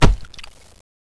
claw strike

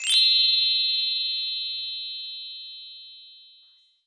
save load; garrysmod

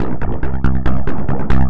beat

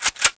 ak47 bolt pull; weapons

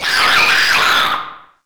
demon alert close; nh demon

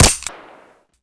usp

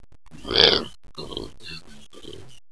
attack; sawer; boss

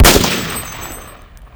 mp40 shoot; weapons

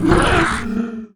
zombie die; shamblie; npc

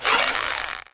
bow start; deathlegends

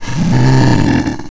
growl; shamblie; npc

